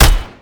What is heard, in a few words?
attack; dota; omniknight